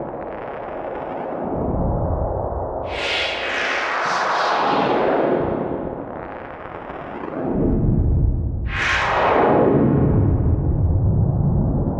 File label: 80 Texture, Ambient Synth Loops; Aerosol